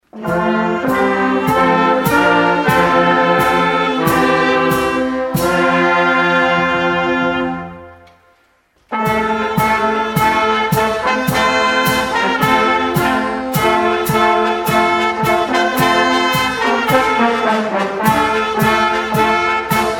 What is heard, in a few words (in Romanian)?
Fanfara